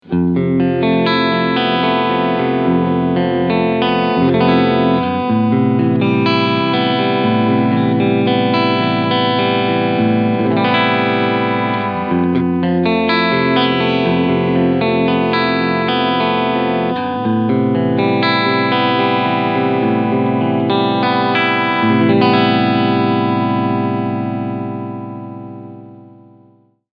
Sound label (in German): Cleansound Pos. IV